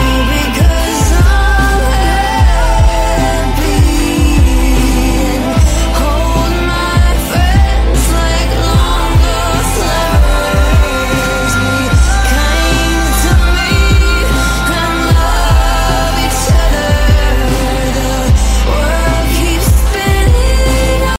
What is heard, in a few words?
music ringtone english ringtones